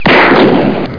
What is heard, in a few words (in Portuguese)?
tiro; PobreJoaquim